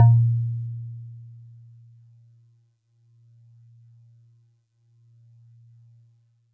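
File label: Pristine Celeste DX100 A#6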